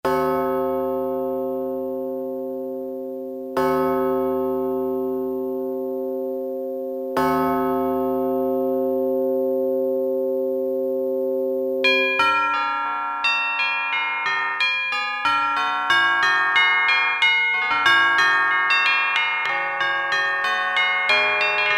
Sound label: Chime Cym; Korg DSS-1; Percussion